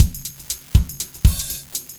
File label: LOOP, 70's Breakbeats; USB Soundscan vol.46; AKAI